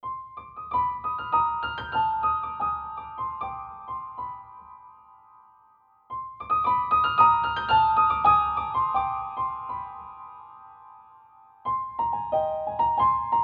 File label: short piano jingle